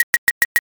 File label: calculator